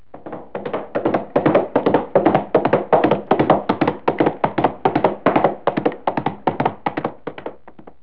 horse gallop

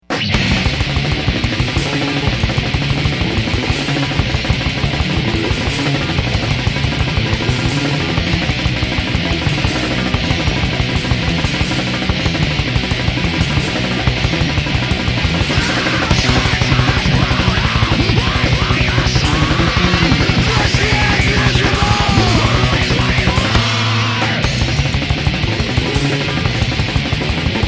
Metal emergency

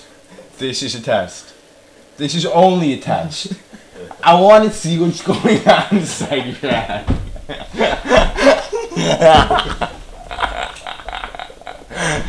laugh

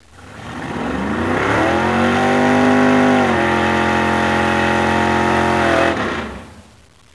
burnout, automoveis, corridas

